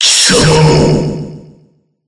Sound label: Roar; BBCF; Susanoo; Roar of the Mad King